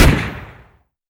gun stop, stuka; wac

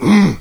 zombie attack; doom3

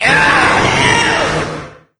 death, wraith; doom3

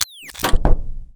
turret battery unload

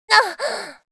hurt; macha

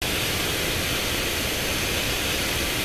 gatling heat steam loop, apc weapons; gow

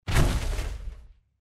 walk, power armor; clothing system

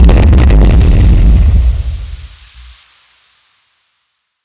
rocket stop; bms objects

